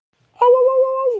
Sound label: auwauwauauwauw